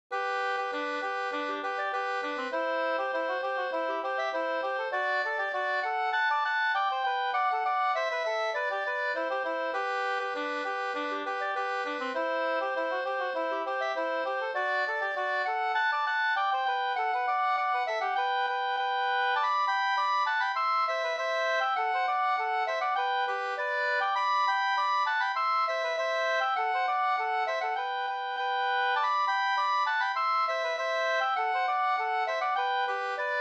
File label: polka